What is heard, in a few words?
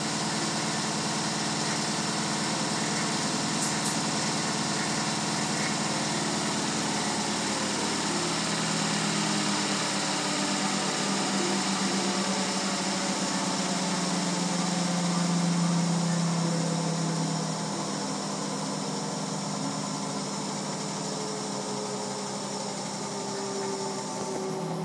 washing machine awful noise